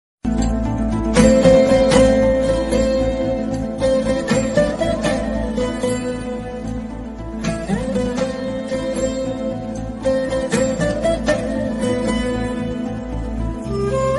anegan bgm ringtone

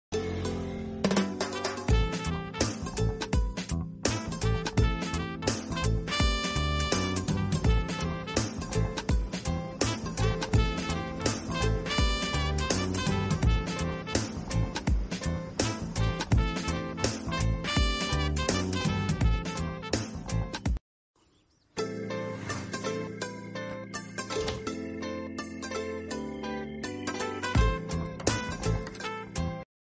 background music telugu bgm; krishna and his leela

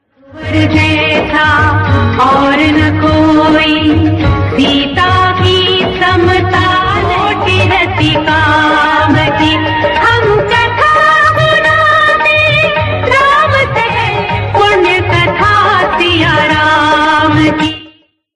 bhakti ramayan ringtone devotional song; shri ram

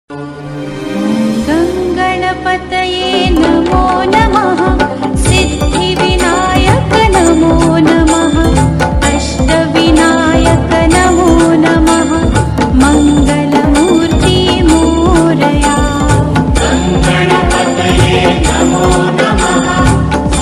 Ganesh ringtone, god ringtone

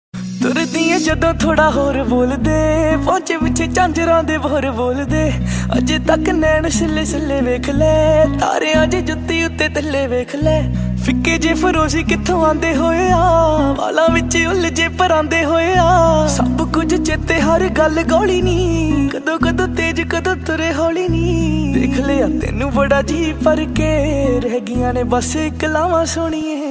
ringtone Punjabi

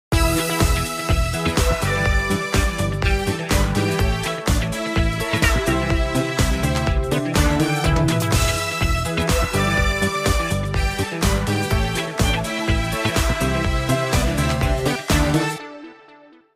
samsung galaxy s21 ringtone; feels good

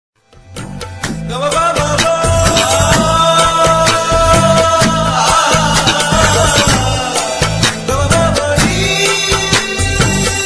bgm tamil

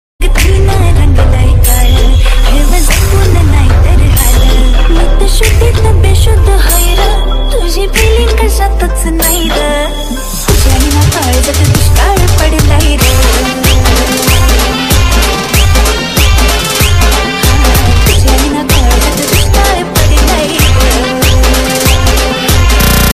marathi ringtone; मरठ रगटन

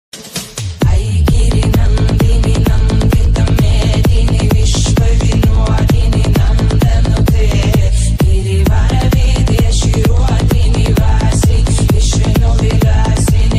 remix ringtone viral ringtone

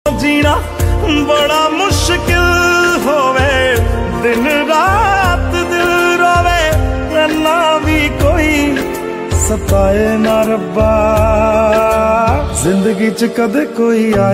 radhe radhe krishna love bhajan ringtone

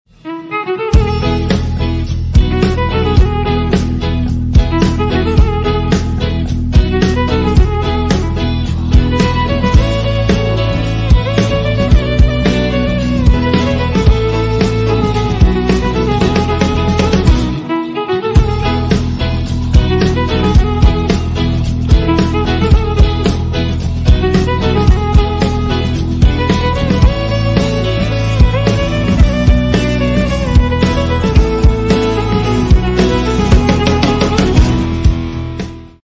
entry bgm violin ringtone

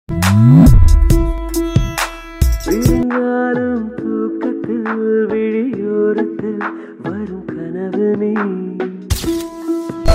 tamil song

